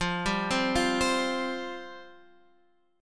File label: F Suspended 2nd F down Guitar Standard; Fsus2 arpeggiated